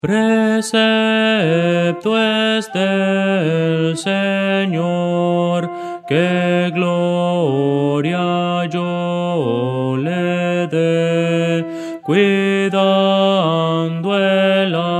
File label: soprano